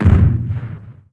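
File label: EXPLOSION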